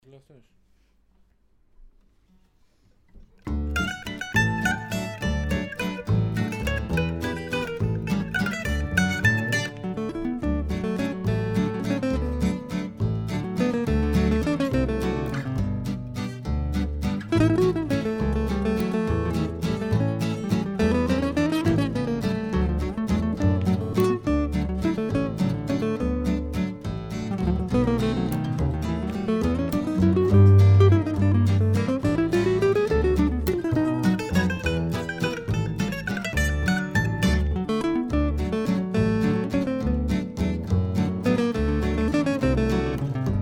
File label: kitchen waltz